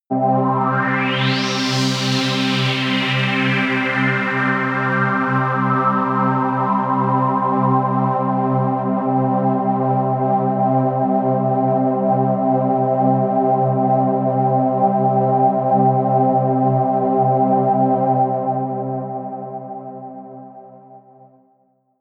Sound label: pad sweep vent; flaechen